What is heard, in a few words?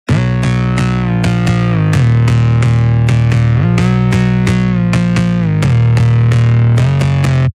full maximal synth